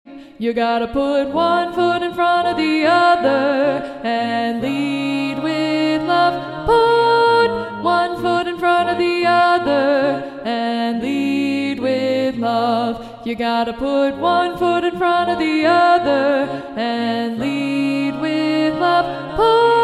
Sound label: one foot melody